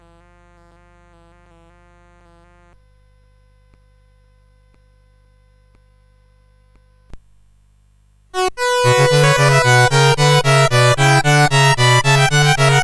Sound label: Bouree, CSG8580R5